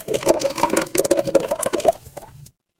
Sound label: water pour